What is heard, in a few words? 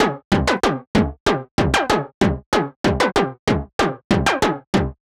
Sheet Kick 95, horrorscape samples 95bpm